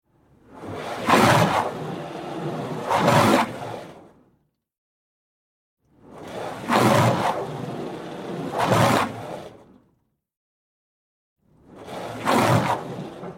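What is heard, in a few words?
Water Spray Interior Car Bys Hard Med; Car Wash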